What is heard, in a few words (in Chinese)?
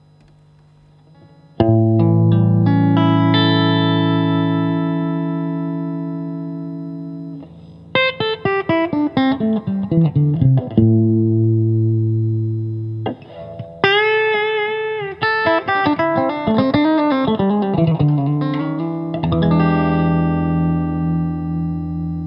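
60S OCC 单晶铜 清音 2档, acoustic guitar; kindman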